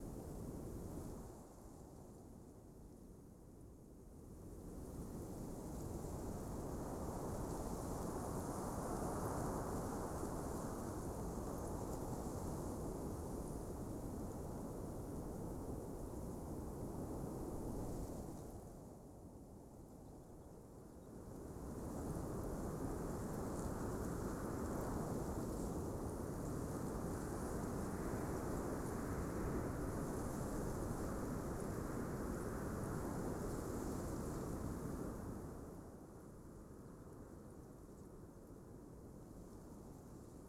Plains East

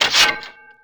Pickup Armour Shield; Pickups

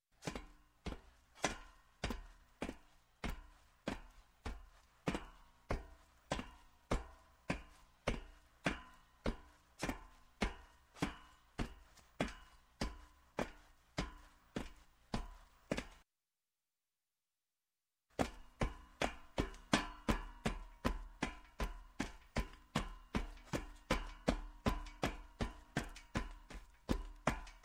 Footsteps, Metal Down Metal Steps Boots, Slow; Sound Ideas 2000 Series

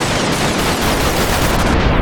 Static; Blastwave

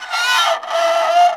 Scrape Metal On Metal; SFX Kit; Creaks, Scrapes, & Misc

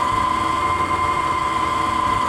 Drop Hatch Loop; Doors

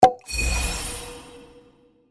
mana potion; Monument Studios

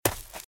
Footsteps - Leaves - Run - Left; RadwinIsland

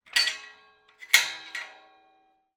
Iron gate, latch, sliding, rotating, lock, clang; Eiravaein Works; Vaeyan IV

